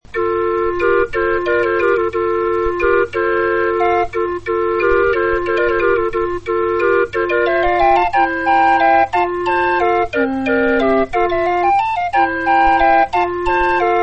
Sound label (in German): floetenuhr